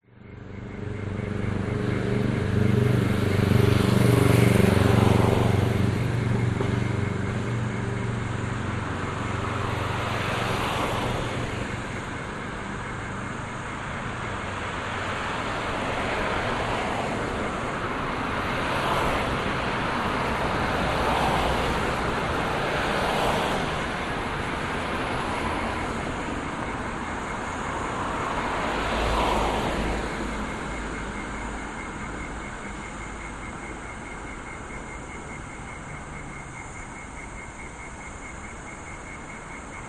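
public places city road evening busy traffic pedestrians insects summer brisbane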